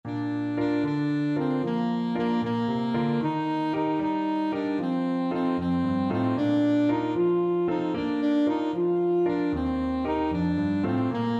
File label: saxophone